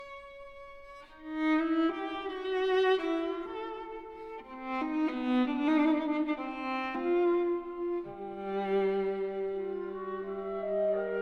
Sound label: concerto for viola and orchestra con moto rubato